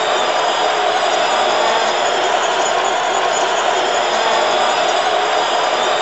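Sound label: Basketball Crowd Jeer Loop; Crowds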